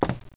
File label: footstep wood; footsteps; gearbox